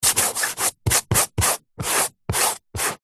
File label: chalk rub erase hand finger short strokes chalkboard; office